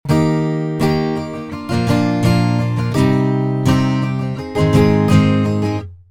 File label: guitar